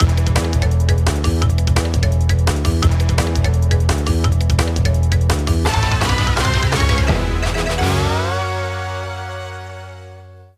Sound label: Battle Start; crash team racing nitro fueled complete gamerip; Legacy